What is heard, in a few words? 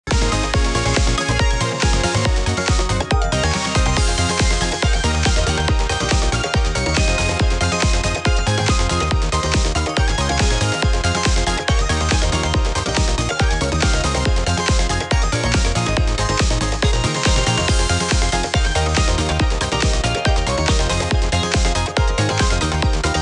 create an energetic and upbeat electronic music